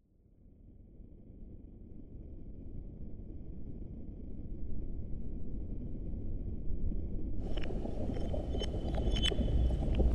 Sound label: surface study stream excerpt